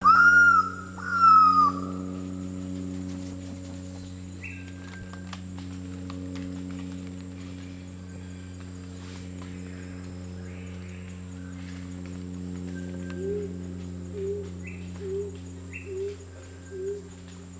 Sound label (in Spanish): canto ave del paraiso piquiblanca